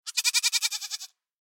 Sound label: goat